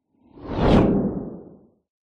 sound design cinematic whoosh fast; zapsplat

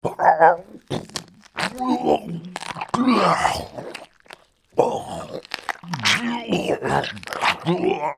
horror monster zombie male eating body; sound effects one

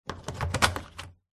household tumble dryer lint filter insert

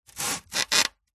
foley polystyrene sheet flat thin scrape creak

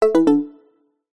notification digital

